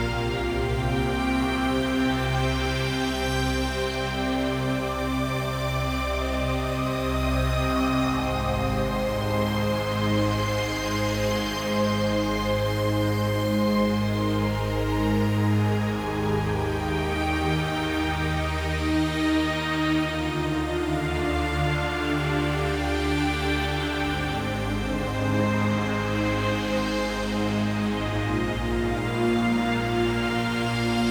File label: Calm Pads Only Loop; Disputed Space